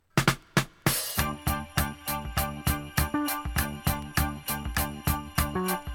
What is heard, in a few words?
jingle